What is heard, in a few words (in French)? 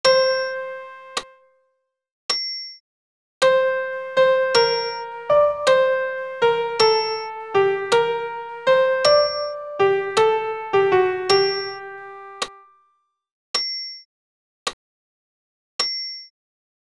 Credo sop pian seul